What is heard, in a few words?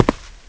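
land left, FOOT; The Elder Scrolls III Morrowind GOTY; XBOX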